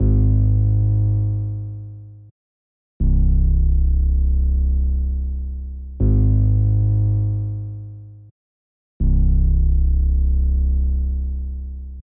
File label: Moog Sub Phatty bass, 160 BPM C Min; Stems; Cymatics; Aquamarine